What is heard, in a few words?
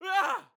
Male in Shock, Screaming